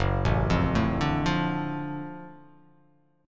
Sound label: Gb Minor Major 7th Sharp 5th; arpeggiated; JGuitar